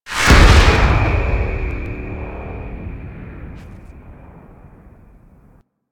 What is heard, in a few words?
Centerpiece Close, Ambience